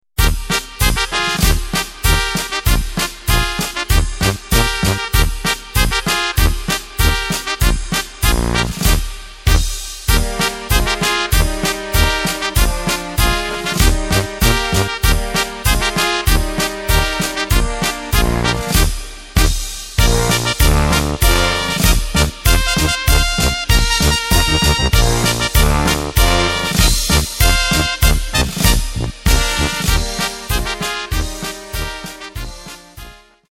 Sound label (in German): Stremtal Polka